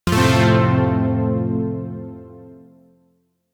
Shawzin Maj Chord G; Chords; Warframe; Zariman Shawzin

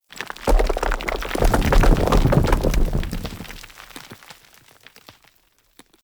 Under City Falling Rock Small; Ambience; Dungeons

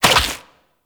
Infested Tendril Step, movement; Zeke; Warframe; Infested Lich

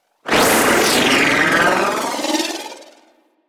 Briefcase Launcher Proj By; Warframe; Weapons; Corpus

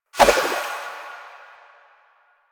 Kunai Whoosh; Warframe; Weapons; Choir

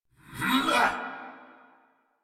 Attack Effort; Warframe; Dax Knight; en

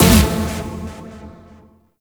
EDM; Warframe; Bard; Bard Instrument Packs

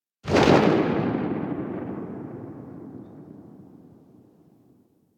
Sniper Tail; Warframe; Tenno

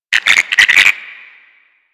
Garden Animal; Warframe; Ambience; Clan Dojo; Dojo Garden Animals

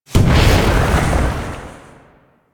Sonic Dissipation Proj Explosion; Warframe; Focus; Vazarin

